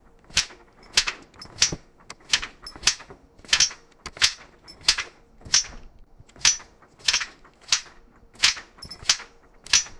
book on metal chains; library

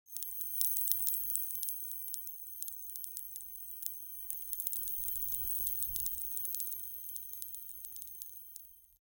sound of magical; powder

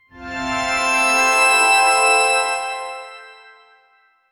orchestral synth hybri, major chord

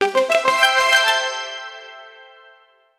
a triumphant brief fanfar, synth arpeggio

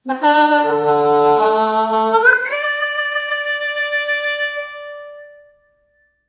real female ahohahh, hall reverb

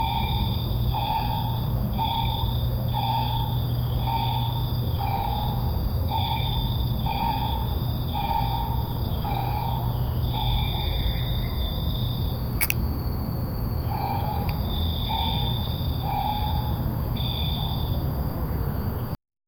a young boy deep asleep; morning

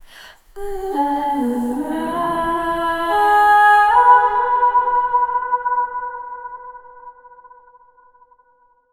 female voice long chantin; aaah; SFX Engine

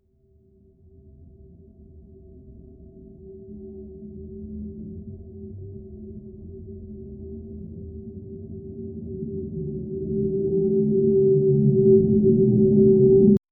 low old film grain sound; silence

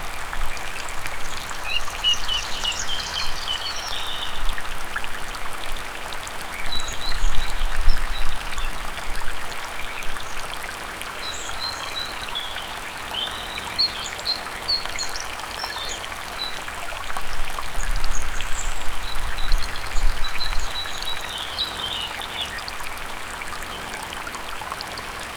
gentle creek stream with

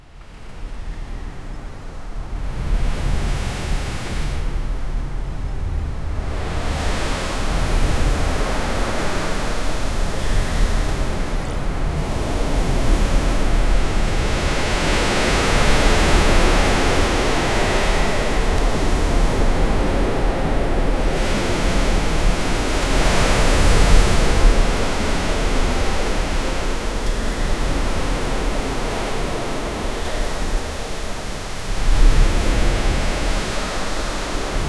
stormy night at sea; ships

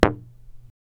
objects

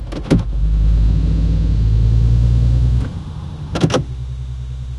gear shifting of a car

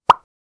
cartoon style pinball hit

